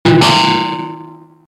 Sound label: guitar cut